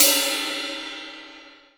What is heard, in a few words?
Cymbals